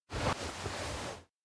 lean close; awayuki; anemoi パッケージ版

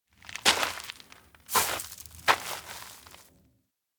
steps outdoor mountain road walk few; anemoi

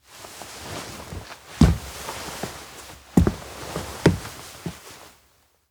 steps outdoor lakeside stand slow; minato; anemoi

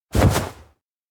bump; awayuki; anemoi

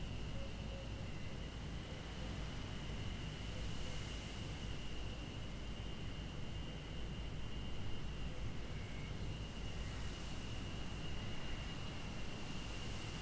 indoor play container; LOOP; anemoi

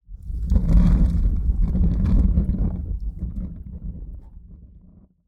creature appear; anemoi

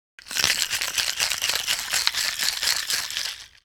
shaker; anemoi

